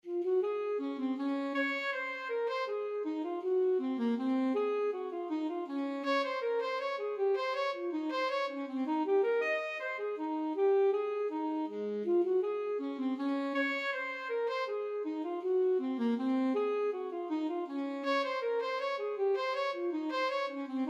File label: bourree ASAX, saxophone